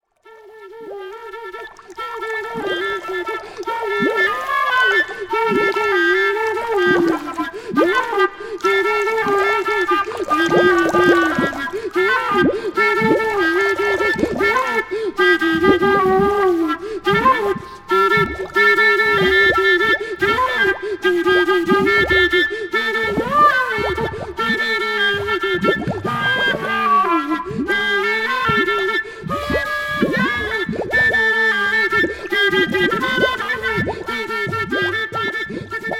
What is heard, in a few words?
studio percussion